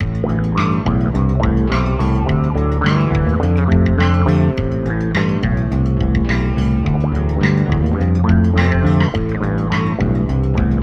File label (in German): Bass; Die 4 Saiten des Baerfu